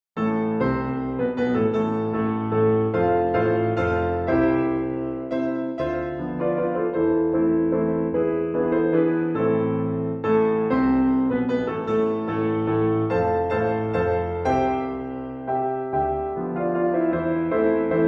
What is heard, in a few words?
Waltz In C Major; No 9; Franz Schubert (PD)